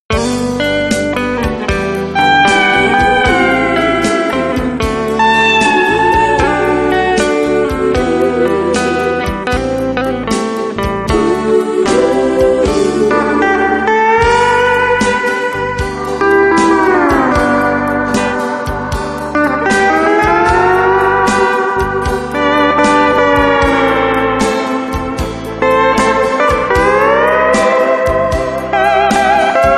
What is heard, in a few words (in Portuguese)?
guitarra